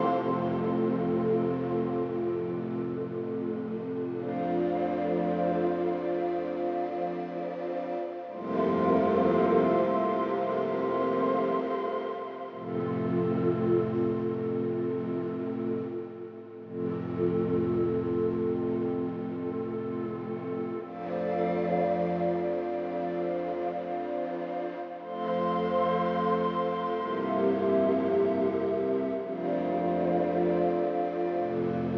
Flood Loop 115bpm; jenny leclue detectiv

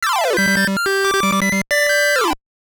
Jingle; Fever Sankyo Koushiki Pachinko Simulation; WonderSwan

